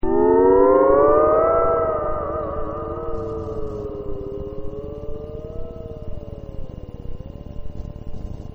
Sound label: wind